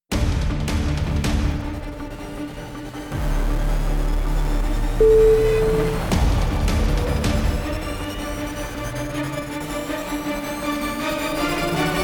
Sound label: epic trailer